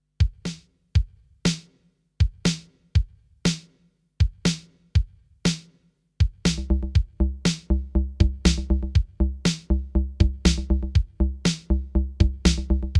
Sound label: TR6S DiscoBeat